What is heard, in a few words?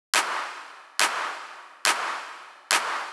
drum Loop 140BPM Clap